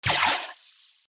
水ロケットの発射音＿弱 03, おもちゃ; G｜音を出すもの

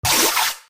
水ロケットの発射音＿中 01, おもちゃ; G｜音を出すもの

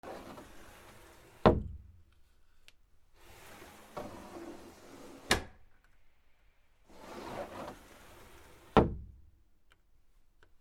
引き戸, 『シュー』; K｜フォーリー(開閉); K05 ｜ドア(扉)

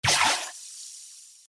水ロケットの発射音＿弱 02, おもちゃ; G｜音を出すもの; 見上げる版

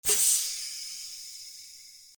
水ロケットの発射音＿弱 B1-02 (+AttackAction), おもちゃ; G｜音を出すもの

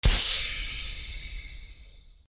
水ロケットの発射音＿強 B1, おもちゃ; G｜音を出すもの

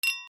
ワイングラスをあわせる 乾杯; L40 ｜食・キッチン

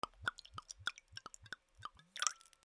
ワイングラスに水を注ぐ; L40 ｜食・キッチン

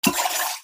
水ロケットの発射音＿中 01-RE, おもちゃ; G｜音を出すもの

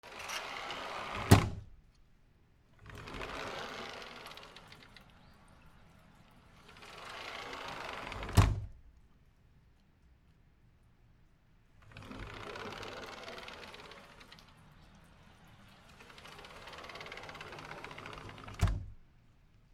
引き戸 アルミサッシ; K｜フォーリー(開閉); K05 ｜ドア(扉)

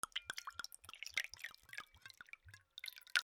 ワイングラスに水を注ぐ; L40 ｜食・キッチン

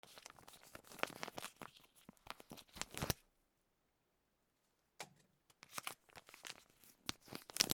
レトルトパウチを開ける; L40 ｜食・キッチン

